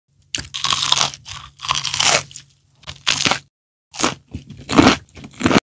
Eating fried onions

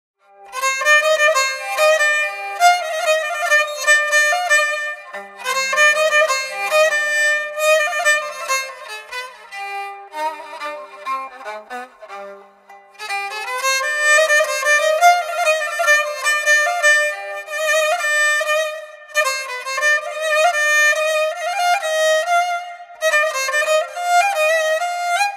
fiddle

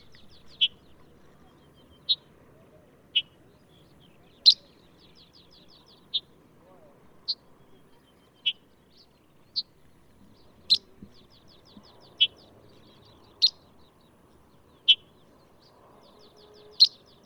Red headed Bunting Emberiza bruniceps

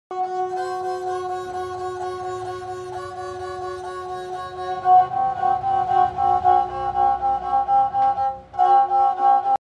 sarangi

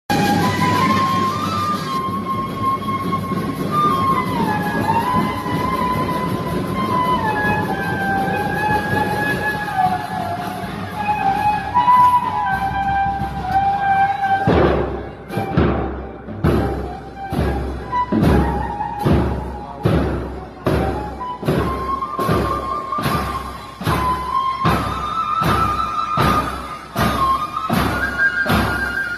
flute

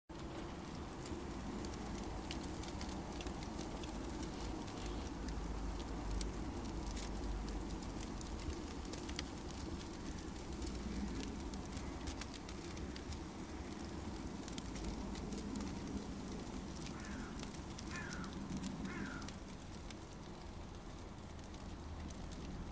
Wood Fired Oven

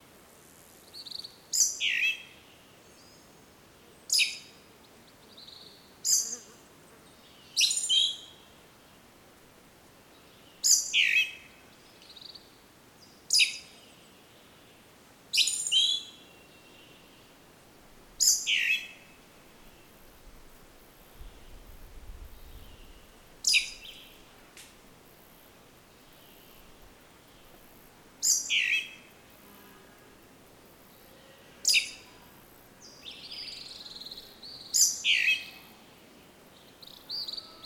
Western red legged thrush

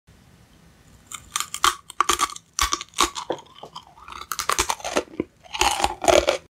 eating pretzels

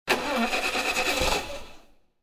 car does not turn on

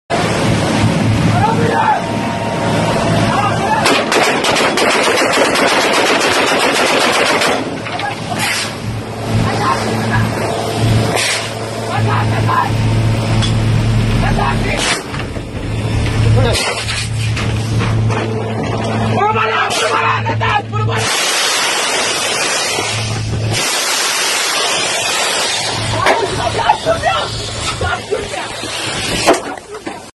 lenj, لنج

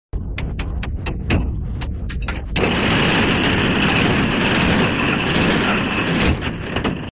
ship brake

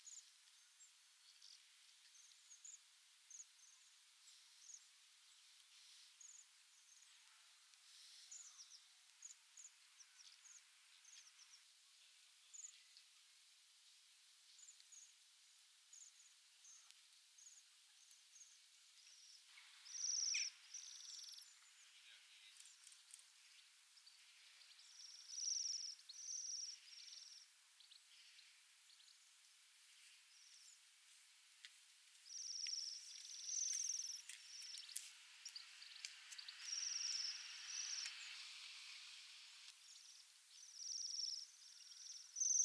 Bohemian waxwing; پرنده بال لاکی بوهمی

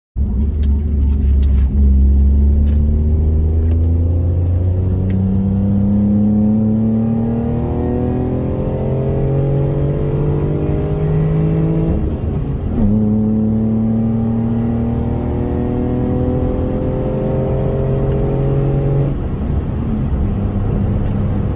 daewoo matiz

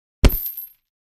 Punching punching bag; مشت زدن به کیسه بوکس

